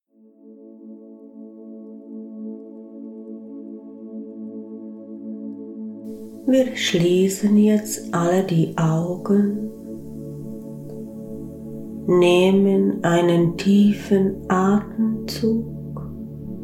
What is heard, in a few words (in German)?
Farbenwelt der Chakren Meditation